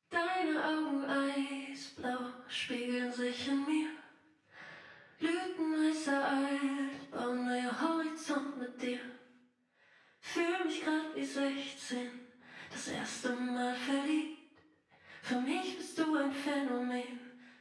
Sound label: Reverb Mid Filling EQ